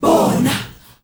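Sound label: bawh nahh, group chant, Africa; Spectrasonics; Heart of Africa 1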